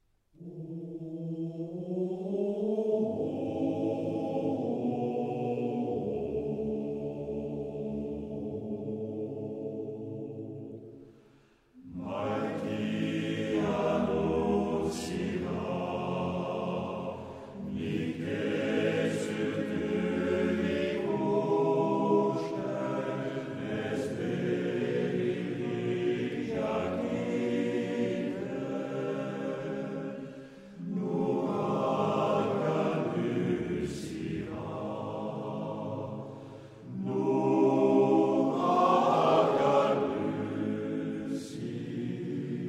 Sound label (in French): musique